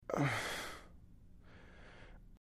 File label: Breath Sigh Light; breathing